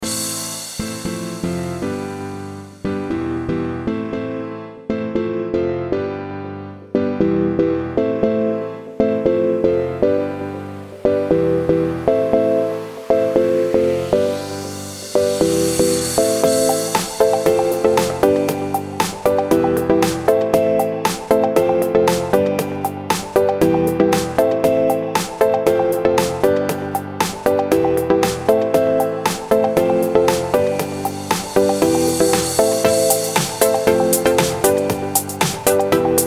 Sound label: Funk